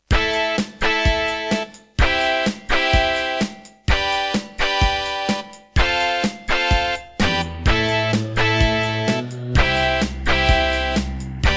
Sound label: Serdaco E-Wave